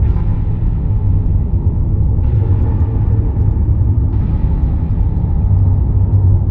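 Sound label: ship ambience; The Rift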